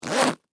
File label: Super Hero Costume, Leather, Bag, Tighten, Zipper; Science, Fiction; Alien Sound Effects; BlastWave